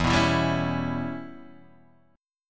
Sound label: G 6th Flat 5th E